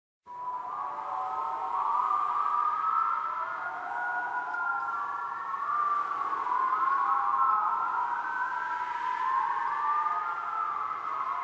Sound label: scream; Whistling / Wind; Audio Network